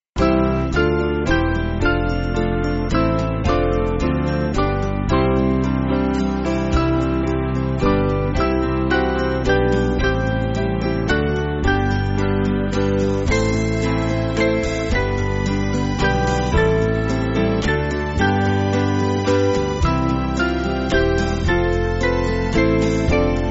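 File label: Band, Backing Files for Churches; Advent Tells Us; Keine Schonheit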